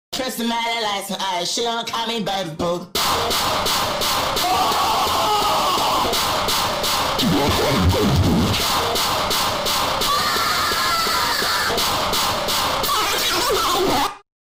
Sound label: baby boo loud